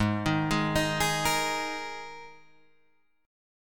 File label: Ab Suspended 2nd Guitar Drop D